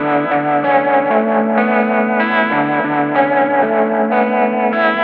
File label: ROCK POP GUITAR